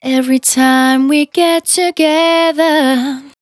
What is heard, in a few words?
Finally 70bpm C, Velvet Vocal; Zero-G